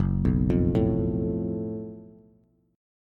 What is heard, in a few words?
Gb Minor Major 7th Double Flat 5th Gb Bass; arpeggiated; 2,2,3,2